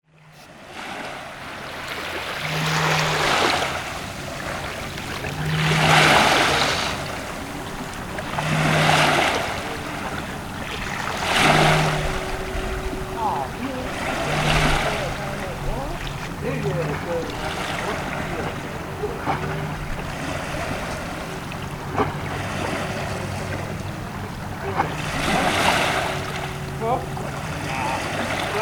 NY Silver Eel Jetty

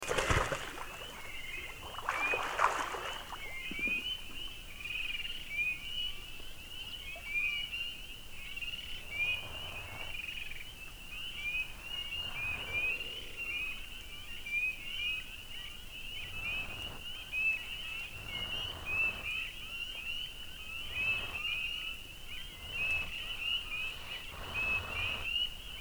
Beaver At Work, Chippewa MN

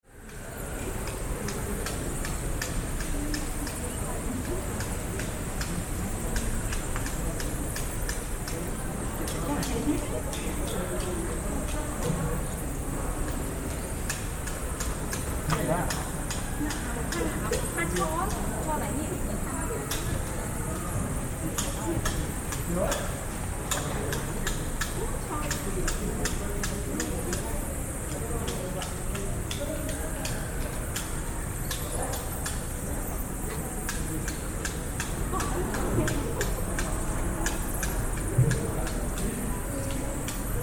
museum bats

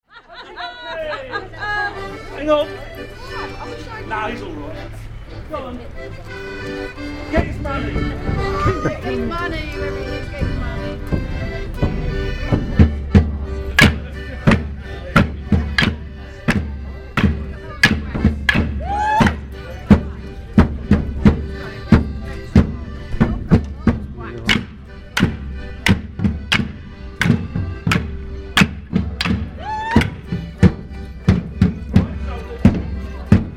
Boxing Day Morris Dancers, Essex UK

